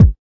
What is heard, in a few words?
kik